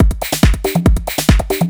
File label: TECHNO BEATS 140